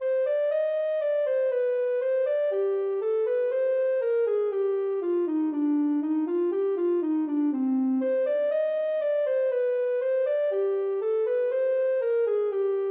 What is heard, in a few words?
bourrée, ocarina; bwv0996